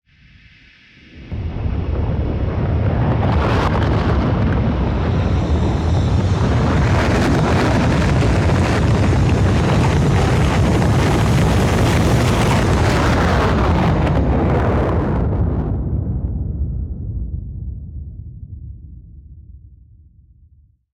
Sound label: silo rocket; factorio 2.0.55